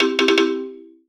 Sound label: Solo Xylophone Positive; MUSIC EFFECT; startide